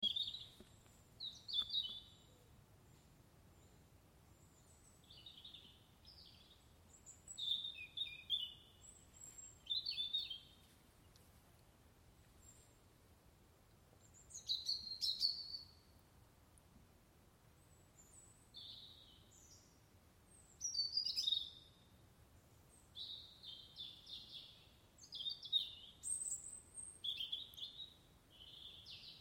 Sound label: birdsong from seckley viewpoint